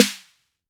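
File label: TR808 Snare, Roland SH-32 Drumset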